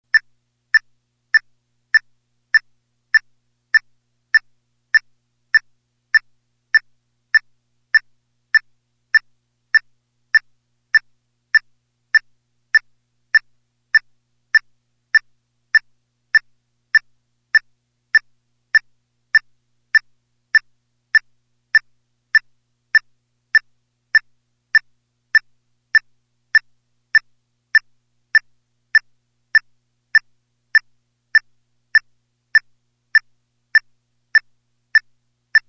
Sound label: 100bpm Metronome